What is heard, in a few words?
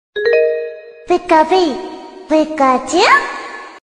cute message ringtone pikachu